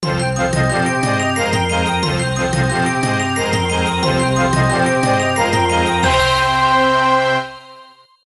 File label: win; TGOP; RuneScape 3